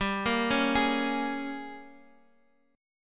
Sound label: Ab Major 7th Suspended 2nd Suspended 4th G